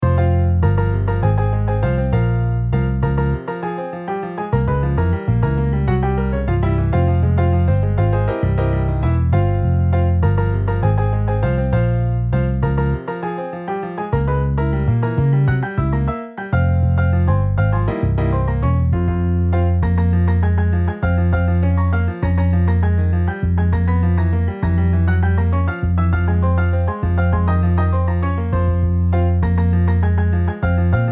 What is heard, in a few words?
Piano Bass MIDI